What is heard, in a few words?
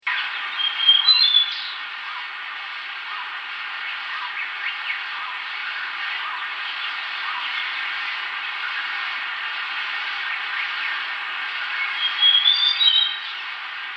white tailed robin